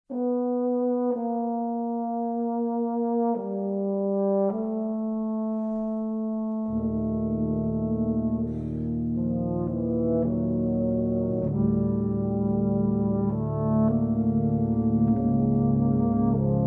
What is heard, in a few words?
Quartet for Tubas, Andante